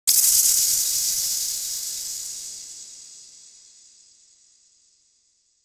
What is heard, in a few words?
sound effect electric zap; electricity